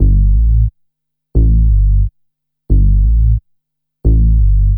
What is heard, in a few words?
BASS, funk; USB Soundscan vol.47; AKAI; Hip-Hop & R&B